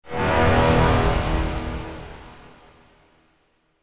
Guitar Chord Musical Tonal Explosion Power Chord; Guitars (Sound Effects:Musical)

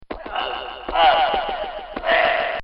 Fist Fight; Boxing; Sports; Bobby Cole

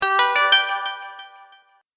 Bright Bonus Win, video game

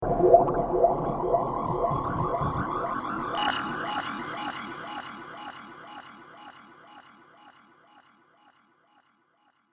Alien Forest; Alien Sound Effects; science fiction sound effect

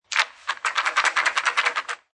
Billiard Pool Snooker Rack Up Balls In Frame; Pool balls, snooker balls, billiards balls

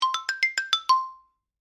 Xylophone Chime; Mallet Sound Effects; Musical; SoundEffects-BlastWave

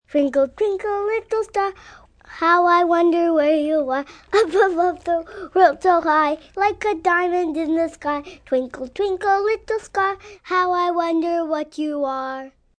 Singing, Singing, child, girl, song; Twinkle

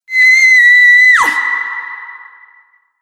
Female Scream With Reverb; Screaming

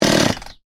Chainsaw: petrol chainsaw engine stop; Machinery; Machines; Machinery Sound Effects; Machinery (Sound Effects:Machines); Stuart Duffield

